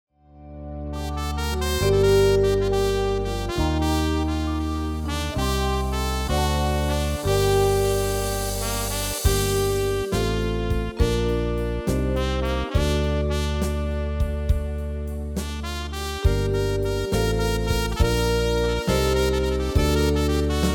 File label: MIDI